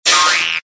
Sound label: comedy crash bweep